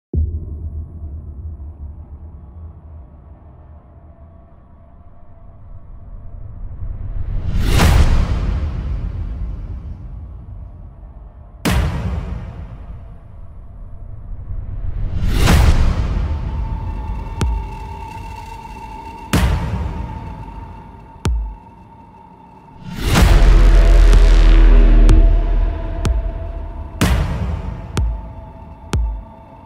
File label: horror trailer music epic dark horror music